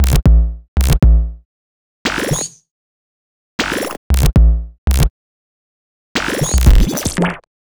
Percussive Bass; Sonic Foundry (Sony Creative Software); Modular Electronica; Basses

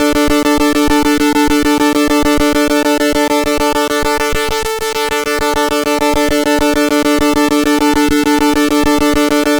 C64 Synth

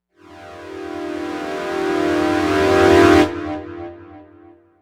Ram Swell; KJ Sawka - Drum n Bass & Beyond; Swells

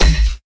Stab G, bass

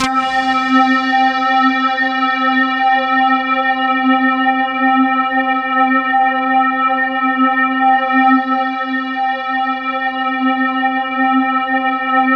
C3, SynthLine; 90 s Sample CDs; NiceBeats; SynthLine VOL-2

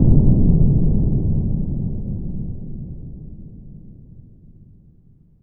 SFX, synth atmos & fx; Dream Zone

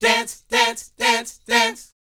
A#A, Vocal, DANCE 120; ILIO; Vocal Planet VOL-4; R&B & Dance

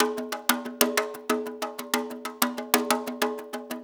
6-8 Conga Brush, Perc Loops; Drum Tools

